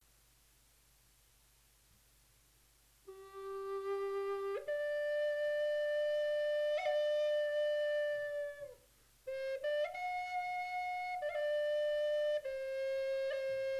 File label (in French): Son flute amerindienne sol 432hz